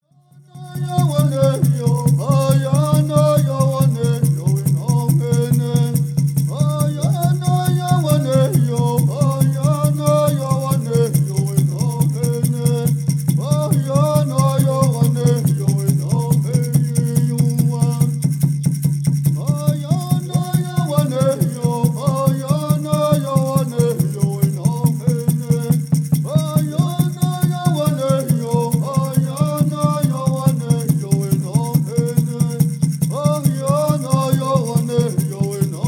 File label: Straight Song